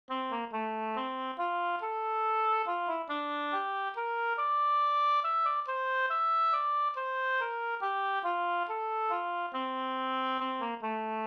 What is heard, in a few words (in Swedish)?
vals